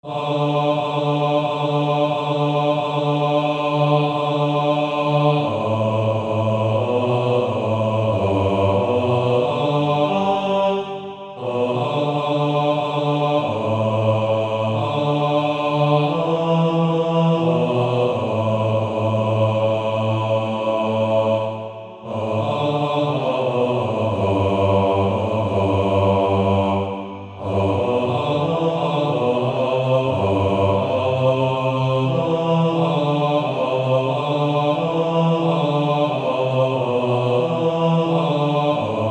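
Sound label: Male Choir, bass; Onward Christian Soldiers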